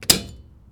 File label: Circuit Breaker